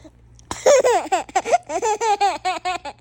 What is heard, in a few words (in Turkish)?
bebek gülme sesi